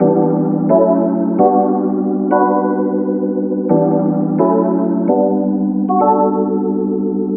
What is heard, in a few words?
House UKG Piano Em 130bpm; 3pack piano bundle; HOUSE & UKG KEYS